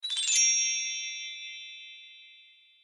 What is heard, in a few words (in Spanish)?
iPhone XS Mensaje